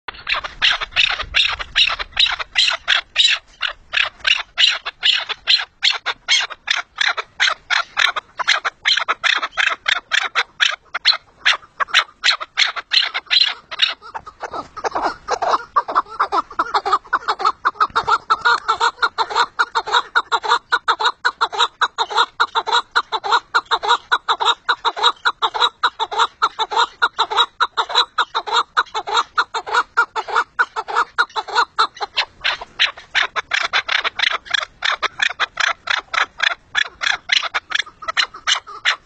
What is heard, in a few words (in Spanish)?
perdiz